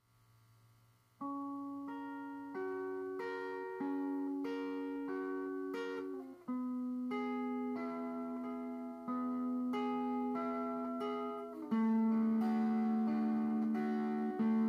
dreamy

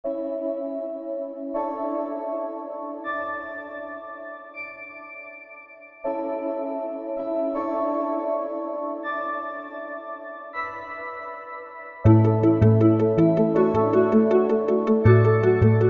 Lyric Peaceful Space Theme

